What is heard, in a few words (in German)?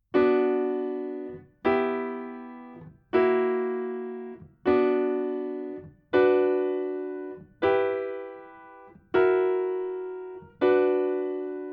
Harmonische Kadenzen in h Moll; Übung 2